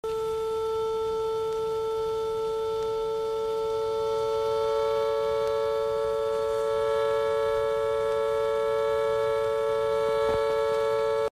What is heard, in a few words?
Whistle; Clyde